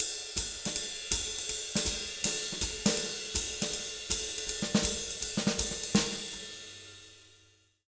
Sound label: 160 SWING, Jazz Latin Drumloops